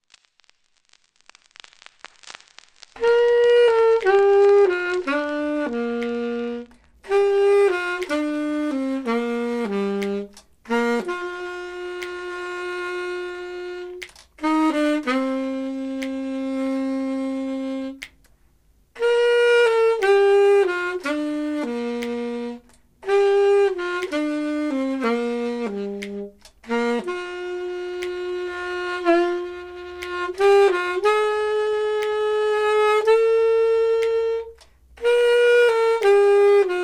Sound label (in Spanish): Saxo